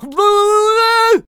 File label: Blunderbuss Attack Vocals